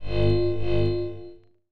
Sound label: Battery Alert, low battery; Pantech Discover-P9090